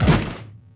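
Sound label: metal hit medium; objects; Star Wars Obi-Wan; XBOX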